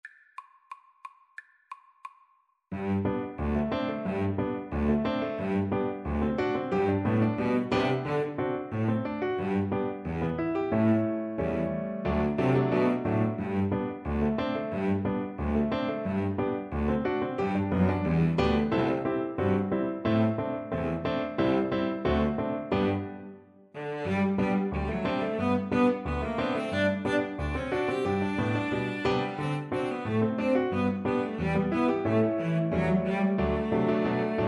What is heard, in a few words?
Piano Trio